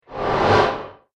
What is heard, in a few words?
Transition Metal Swipe; Whoosh Sound Effects